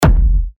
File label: Kick D#; techno; Lagoon